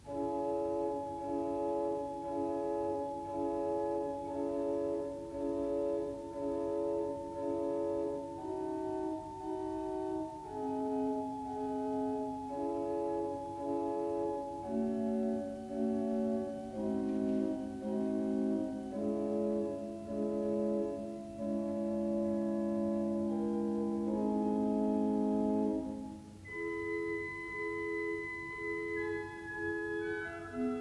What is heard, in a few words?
Adagio, orgel; orgelkonsert; Stanley Voluntary VIII 2